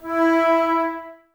flute, Wood Winds, Flutes

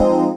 CHORDS; EastWest; Dance Mega Hip Hop Electro

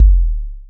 Bd Sub, Kick Drums; Zero-G; Soundclash

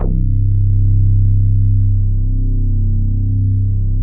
MEMORYMOOG; Big Fish Audio - First Call Vintage Keyboards

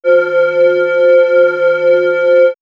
Swirls, pads; X-Static Goldmine

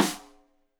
Rim Shot Stereo; Deeply Sampled Drums; SMDrum

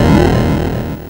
Atari Noise Long, Atari 2600

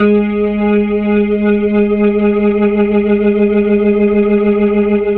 HAMMOND; 3D Sound; 3D Keys VOL-1

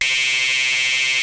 OSCAR C3, synthesizer; Hollywood Edge - Giorgio Moroder Rare Synthesizer Collection

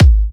G#, kicks; markus hakala kicks

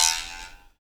TWANG, INDUSTRIAL

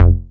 BAS F1, 2 Step; USB Soundscan vol.51; House Side Of 2 Step; AKAI

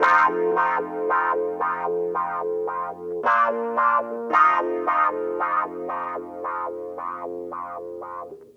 Raw Funk Clavinet; Sonic Foundry (Sony Creative Software); What it Is! 70s Analog Funk

